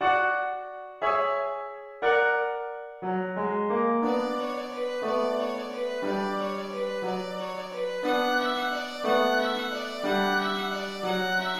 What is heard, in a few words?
violin